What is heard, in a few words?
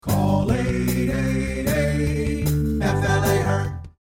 Jingle Out